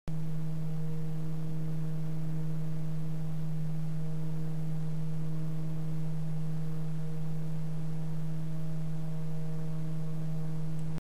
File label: Noiseblocker BlackSilentPro PL-2 12V